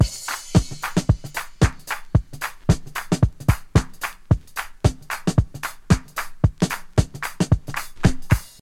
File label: 112 bpm modern drum groove a key, Free drum loop - kick tuned to the A note